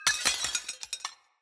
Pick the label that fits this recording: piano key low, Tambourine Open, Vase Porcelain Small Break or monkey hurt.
Vase Porcelain Small Break